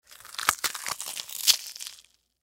Food Green Pepper, Foley Food Green Pepper Peel Rip Tear Vegetable; Household; Sound Effects:Household; SoundEffects-Blastwave